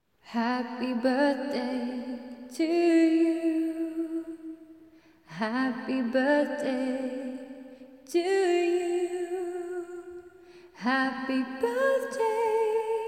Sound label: Happy Birthday English; HAPPY BIRTHDAY SONG